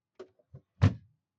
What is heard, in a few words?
door open close; Dolby Atmos; sfx